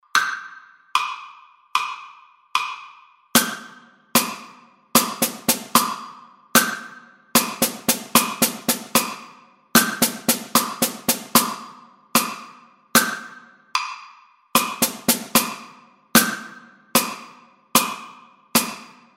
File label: drum set